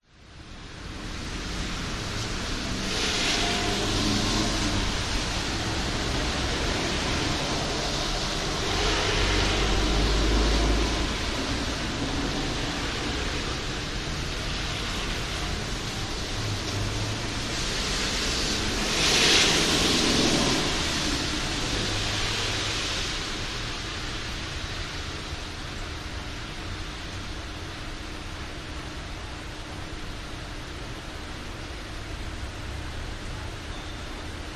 public places industrial estate rain wet roads traffic